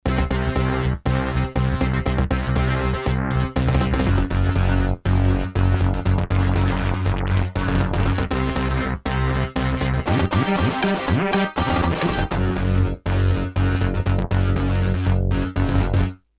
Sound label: Bas Layer, M-Audio Venom